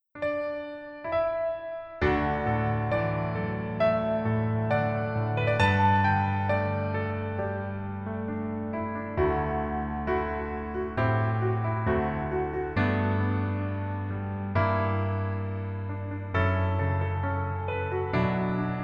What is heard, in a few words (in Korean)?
Piano ver, 멜로디MR